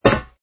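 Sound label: footstep steel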